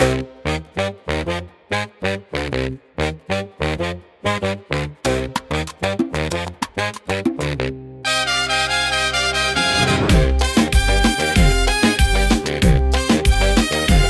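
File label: red-hot asphalt; gta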